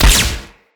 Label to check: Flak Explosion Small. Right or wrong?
Right